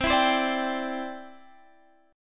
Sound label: C Major Add 9th, Guitar Standard; strummed; JGuitar